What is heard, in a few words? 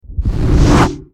Skill Charge; Angry Birds Epic; Skill Charge Missinghealth